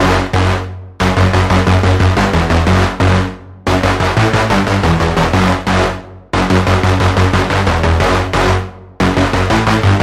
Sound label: lead; Butcher; Routed Sound; Vital Expansion - Doom Carpenter Vol.1